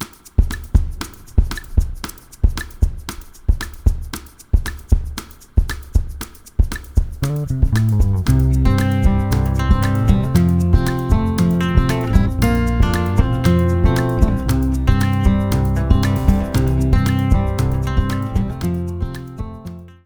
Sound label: DANCE instrumental